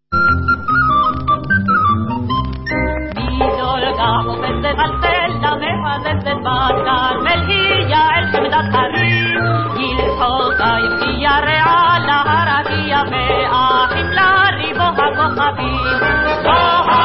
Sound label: VOCAL